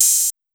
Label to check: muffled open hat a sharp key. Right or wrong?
Right